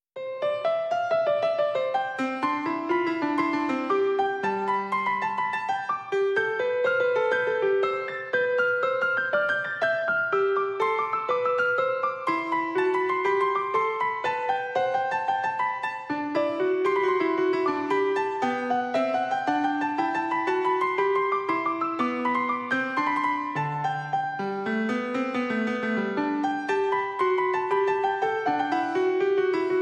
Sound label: Invention in C major; BWV 772